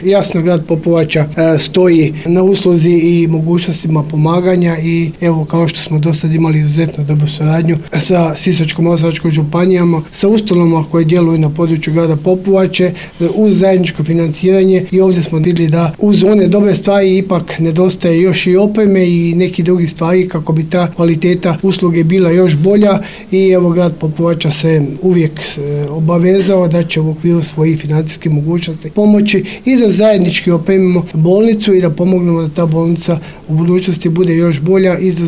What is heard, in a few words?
POP